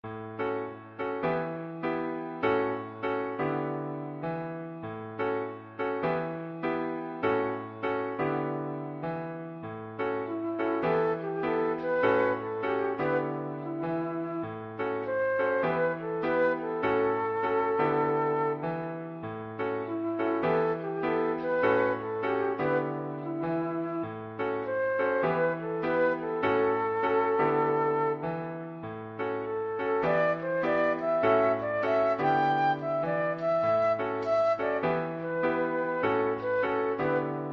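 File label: flute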